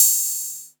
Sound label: bright open hat one shot a sharp key, open hat tuned to the A# note